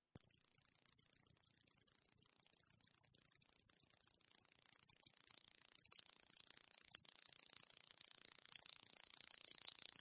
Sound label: FX classic ARP S&H